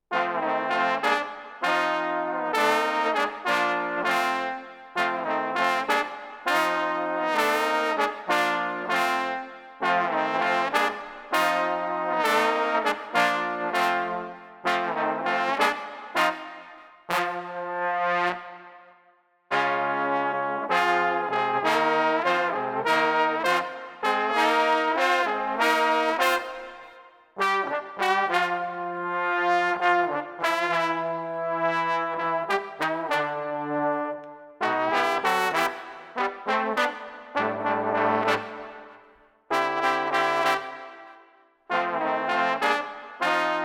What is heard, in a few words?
Street Trombones Rolling Groove 99